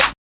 Snr, Snares

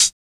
Closed Hats

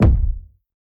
Kicks

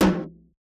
Hi Tom, Percs

